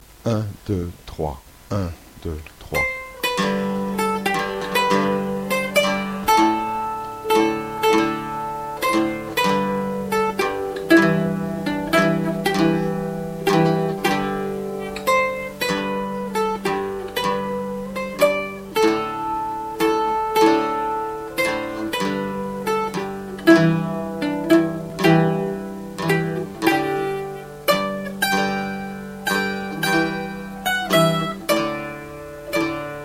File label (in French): Rythmique medium; south wind orchestral